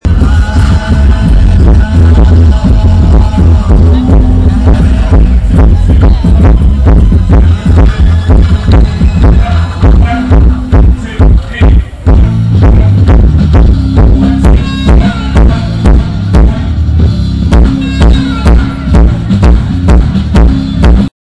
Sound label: gong kick drum sound check